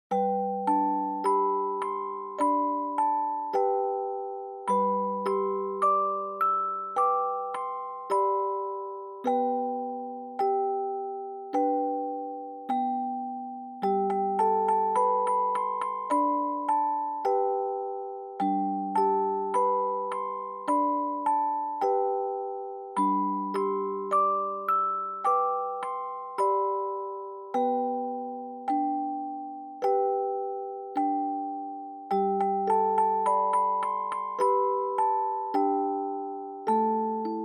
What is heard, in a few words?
Dry Fast; Kaeru No Gassho